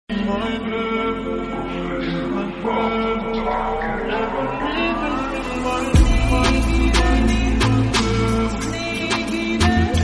slowed reverb ringtone

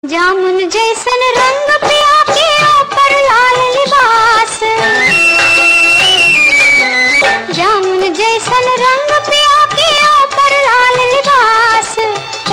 BHOJPURI RINGTONE

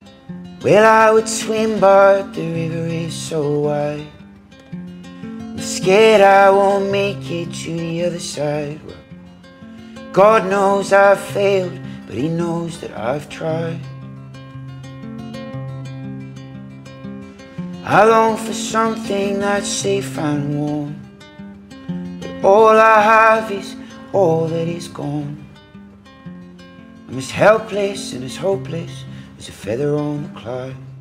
ACOUSTIC RINGTONE

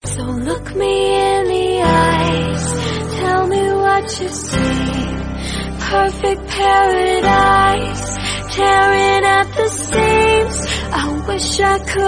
FEMALE VERSION RINGTONE

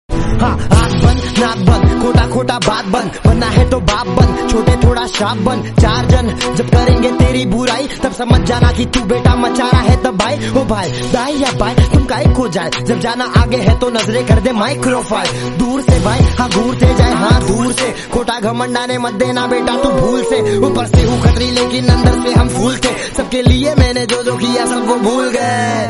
trending rap song ringtone

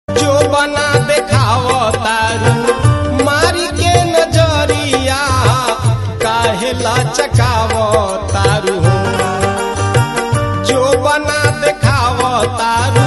BHOJPURI RINGTONE

